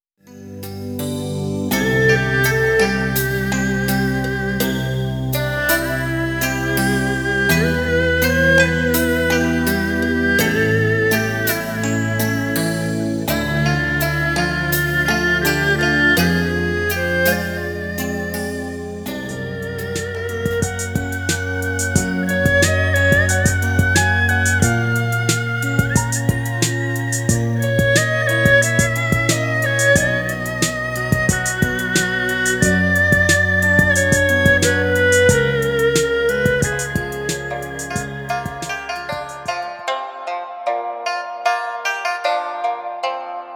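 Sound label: east meets west, BACKGROUND MUSIC FOR VIDEOS; east meets west demo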